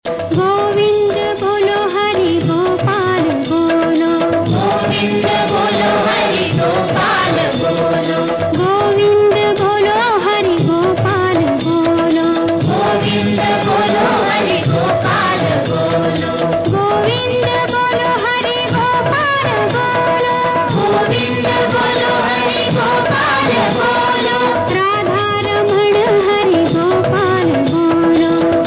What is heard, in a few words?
Music